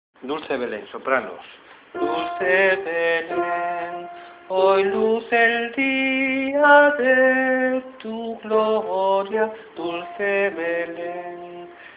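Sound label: soprano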